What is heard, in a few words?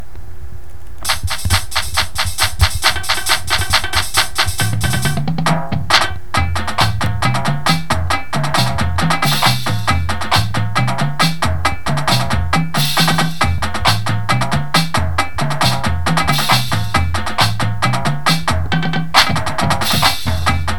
full drumline